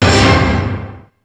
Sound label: ORCH HIT; Rubber Chicken Software Sample Bank